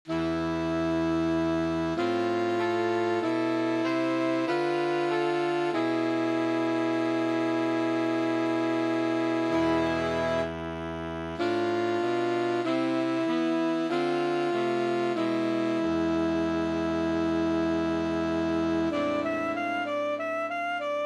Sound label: Prelude, sax